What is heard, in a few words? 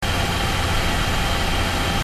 fan